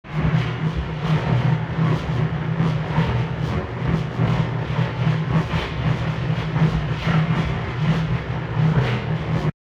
ship engines; World Of Warships

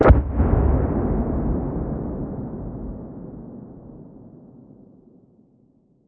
combat atmosphere; World of Tanks

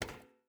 footstep barefoot metal walk; Rust

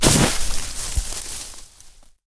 haystack impact; tanks; War Thunder

